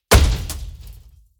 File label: Impacts processed; Just Impacts; soundbits